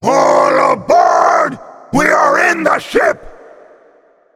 Pirate ship move

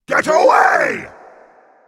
stomp; Pirate

